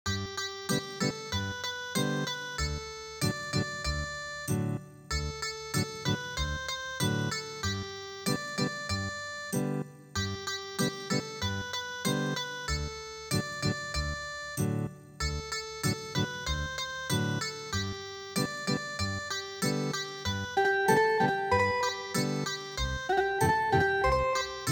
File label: Ethnic Guitar, midi traditional